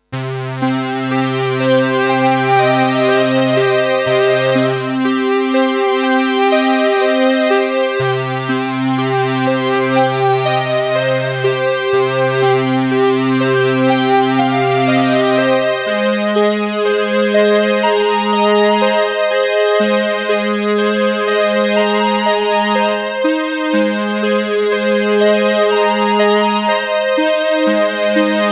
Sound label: Boss CE-300